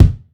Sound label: clear kick one shot f sharp key